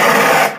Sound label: smartgun fire; weapons